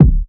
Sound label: rich lows kick drum sound d key